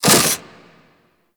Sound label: Reaper Meter Full; Wraith